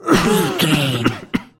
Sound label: voice male coughing